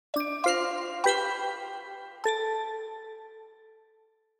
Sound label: soft bells